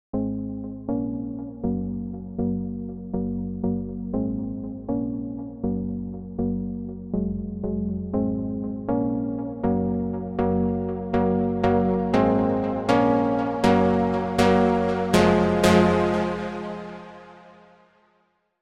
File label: synth desperado